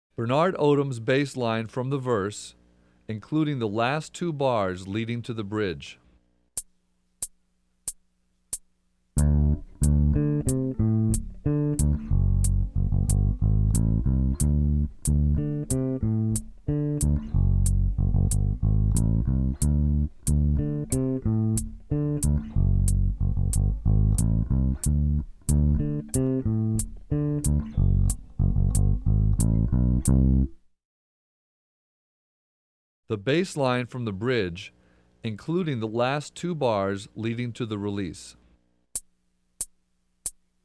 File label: Bass; komplementaerrytmik